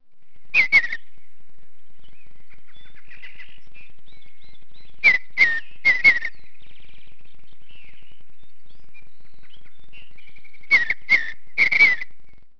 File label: BLUEBIRD; Webster's Millenium 2001